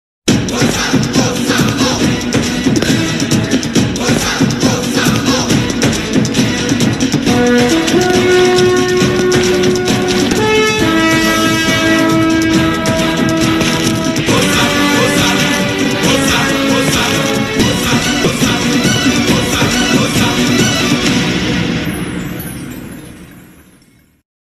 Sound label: malayalam full movie song entry intro bgm; mohanlal; natturajavu